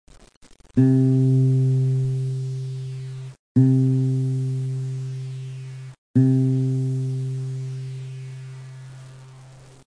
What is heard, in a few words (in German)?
akustikgitarre, ton c